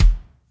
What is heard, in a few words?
Big Juicy Kick